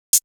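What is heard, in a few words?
Hi-hat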